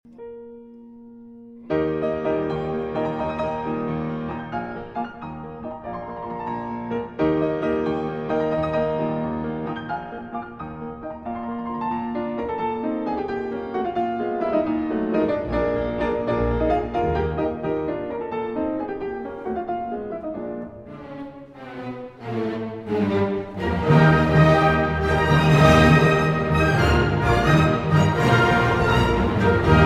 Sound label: Piano Concerto in E Flat Major, Rondo. Allegro; Piano Concerto No. 5; Op. 73 Emperor